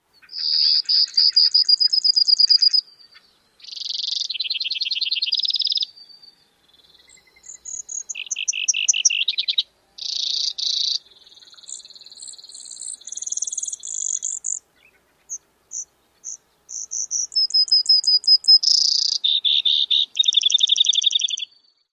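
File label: Brewer's Sparrow variable song; CA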